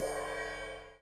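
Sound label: ride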